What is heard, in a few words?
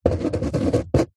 office pen ball point write on paper on wood table; zapsplat